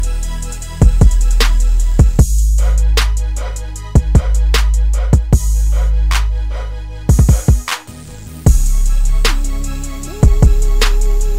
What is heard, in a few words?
English Love Ringtone